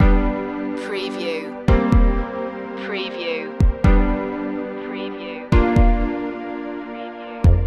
groovy background loop

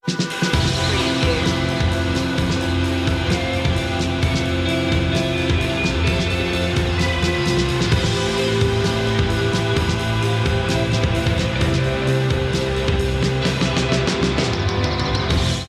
wind

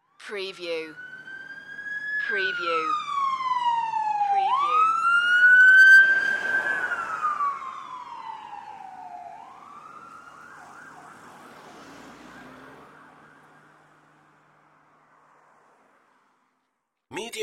Siren Drive By

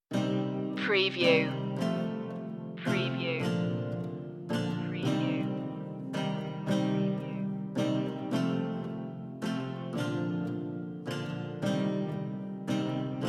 medieval love story underscore

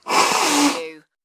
Nose Blow

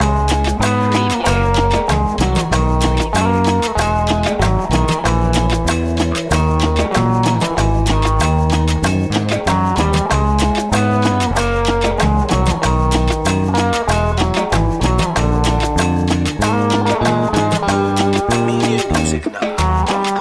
colombian party loop

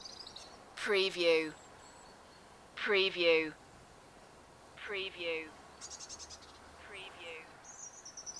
light wind and birds loop short

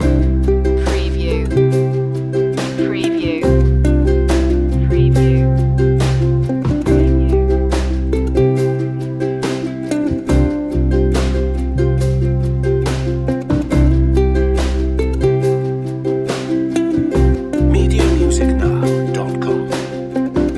acoustic ballad loop

